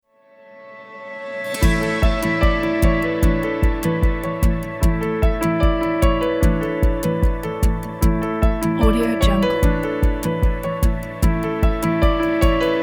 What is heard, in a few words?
uplifting corporate motivational inspiring; shiva-sound